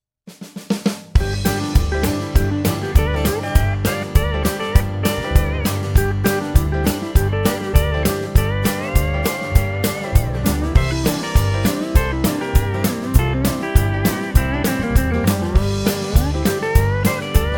country blues g

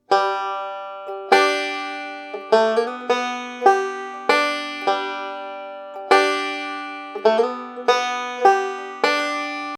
banjo licks

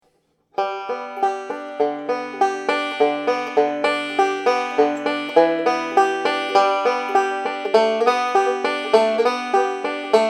tom dooley 100bpm

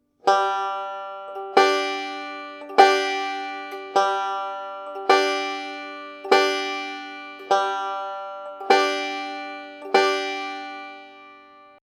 waltz pinch pattern

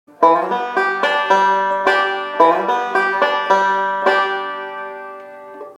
lick (2-5 slide) variation

